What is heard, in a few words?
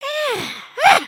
ninja build forward, emotes